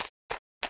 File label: paw footstep